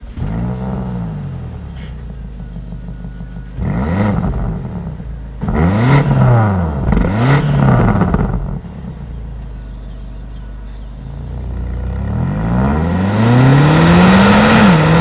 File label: Stock Exhaust BMW XM; g09